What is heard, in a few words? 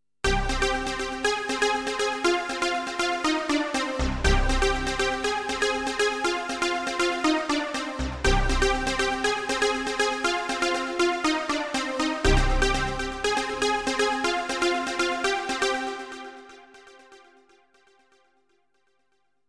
JP8000 Trance